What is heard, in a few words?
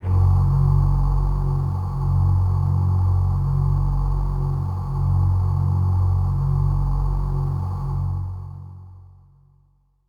Voices Kawaii F#0